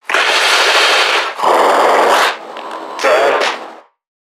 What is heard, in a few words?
Creatures Vocalisations Infected; NPC; Five Nights At Akers; soma; npc construct